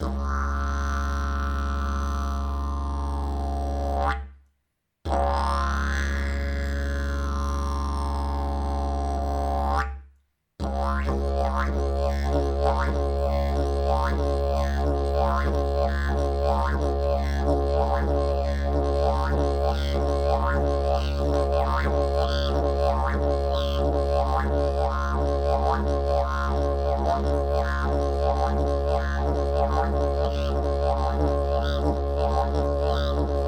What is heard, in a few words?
didgeridoo; javor krasotvor; 31 mm; Ladění : Cis; Tomáš Dufek; 146 x 7 x 4 cm